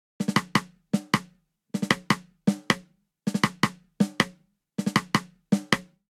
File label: Twist Snare & Rim 157 bpm; 4 4; MIDI Clips; Ableton; Factory Packs; Drum Booth